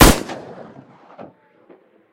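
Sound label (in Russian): неосторожный выстрел в глубокую даль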